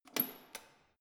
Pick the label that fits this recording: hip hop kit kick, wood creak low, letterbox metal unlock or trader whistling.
letterbox metal unlock